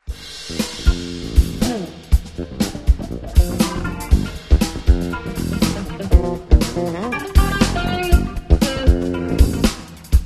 guitar live

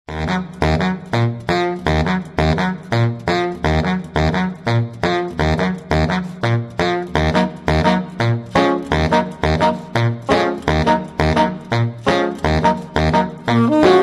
sax

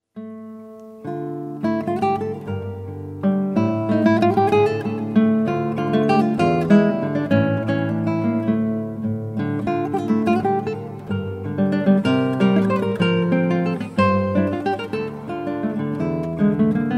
Romantic Guitar

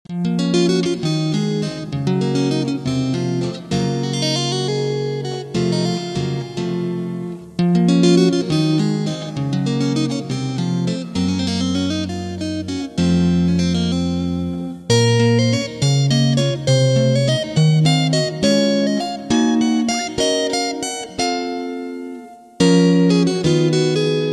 guitar studio

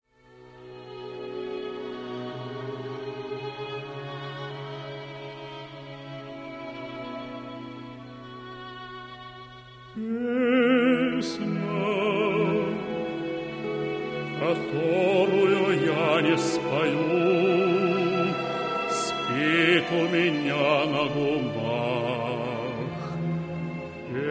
chamber

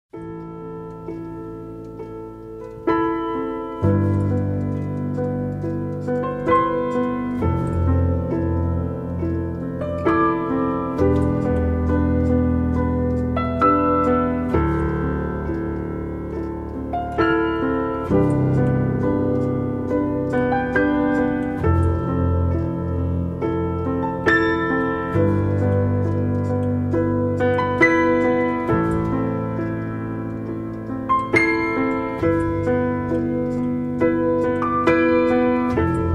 Piano

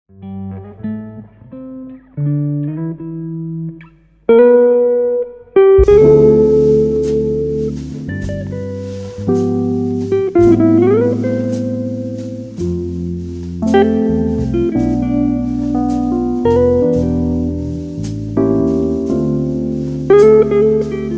Jazz

